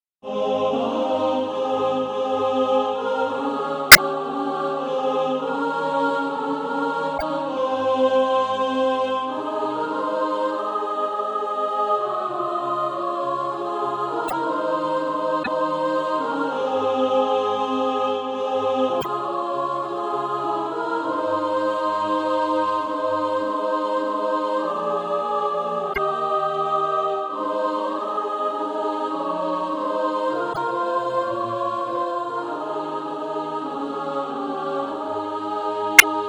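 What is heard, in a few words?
soprano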